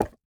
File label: phys impact wood; Rust